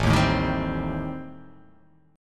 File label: A-Minor 7th Flat 5th-A-down, chord; Am7b5 strummed; JGuitar